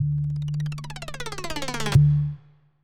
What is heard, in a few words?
Spiderweb